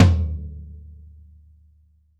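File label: floor tom; drum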